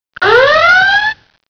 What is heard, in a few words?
RED ALERT